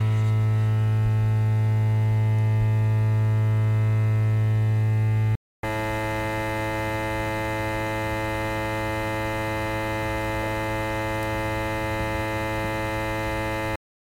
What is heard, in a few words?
noisepolution from two trafo units in noordgouwe